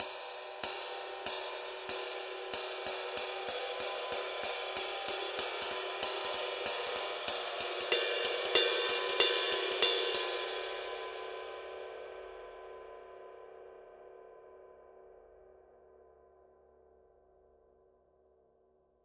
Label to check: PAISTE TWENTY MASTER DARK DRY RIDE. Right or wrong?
Right